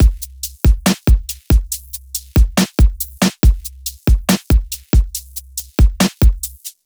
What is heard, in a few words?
Slomo Beat 140 BPM; Loops; vengeance essential club sounds-3; Slow Motion Beats